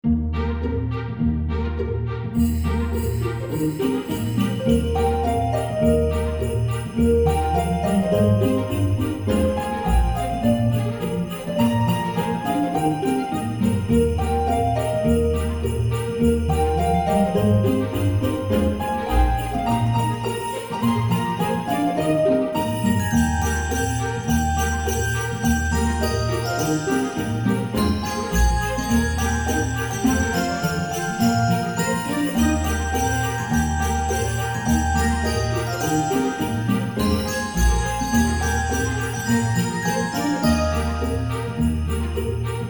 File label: Jingle Bells